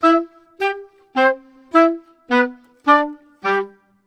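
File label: 105 woodwinds, Orchestral arrangements, A minor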